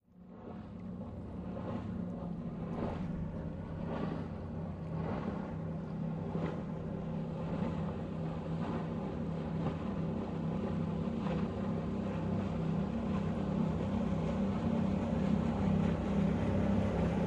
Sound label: Military Pbr: By, Medium Speed. Wake Splashes On Moored Boat.